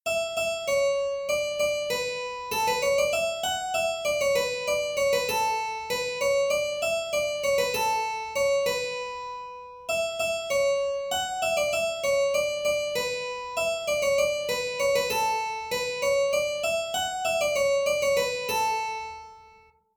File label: Melodic Dictation